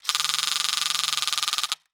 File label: Cartoon Toy Gun Fire Automatic Quick; AdobeCC